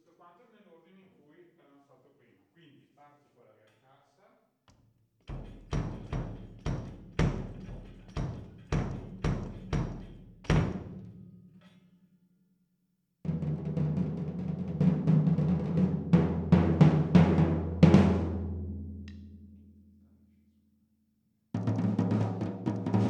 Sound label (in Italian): batteria dinamico